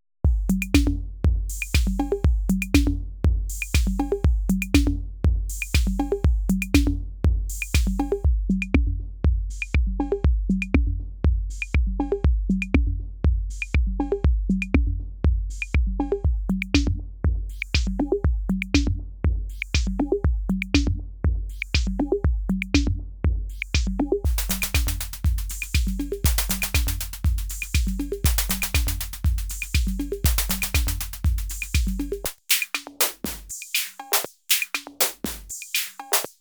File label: FILTER SEM Drumloop; ARTURIA